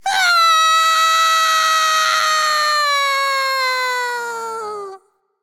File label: fear; Smite; ymir v2 skin19